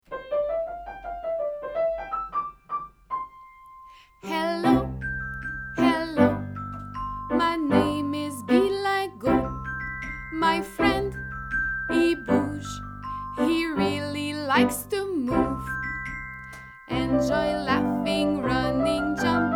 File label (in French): chanson thème